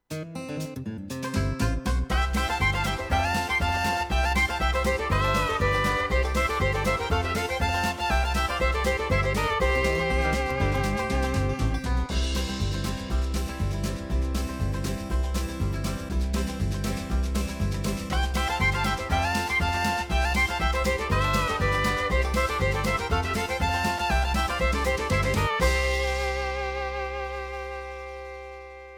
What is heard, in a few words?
styles new blue grass; yamaha tyros 5